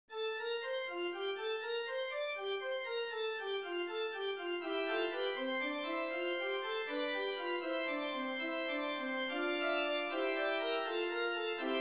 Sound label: Little fugue organ